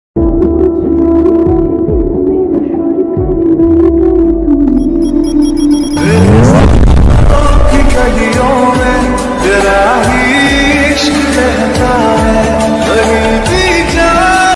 lofi ringtone slowed reverb lofi mix love ringtone